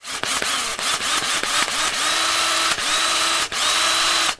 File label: Drilling